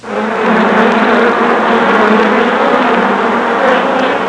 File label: Swarming insects sound